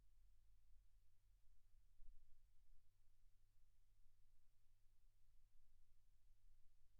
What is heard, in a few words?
silence 48kHz